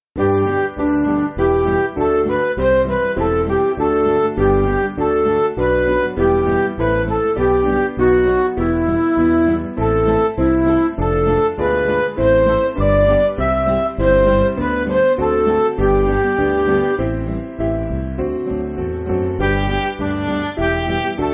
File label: Midi